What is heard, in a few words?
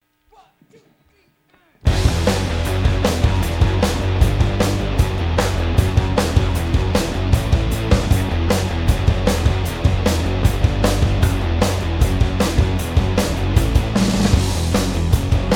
Overdrive Instrumental; PM USA